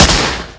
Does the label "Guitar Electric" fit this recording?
No